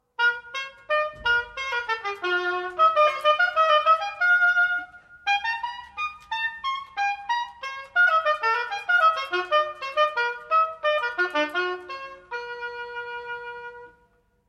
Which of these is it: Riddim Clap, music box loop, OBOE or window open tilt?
OBOE